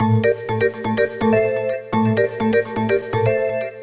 SYNTH HOUSE 125 A, Keys; eJay

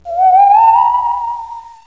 ghost

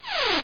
Zap

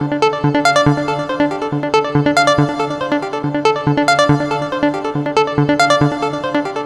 Techno, Melody; Techno Music Maker

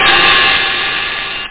AKAI OPEN; Groovy Bytes: Behind the Moon

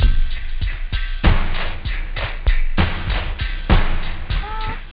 disco, instruments wave loop house

